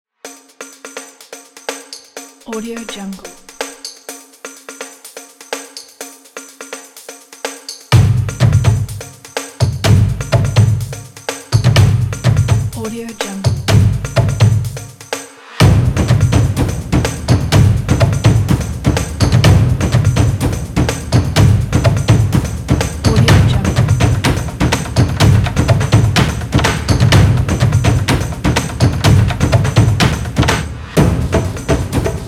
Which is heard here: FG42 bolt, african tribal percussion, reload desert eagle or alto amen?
african tribal percussion